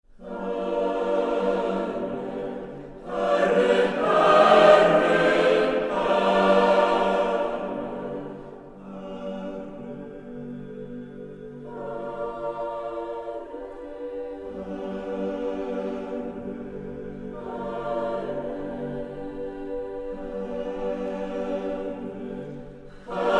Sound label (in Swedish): Kyrie Mässa på svenska språket